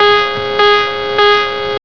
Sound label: warn